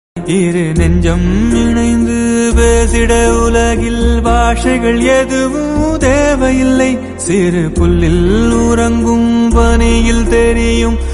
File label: tamil song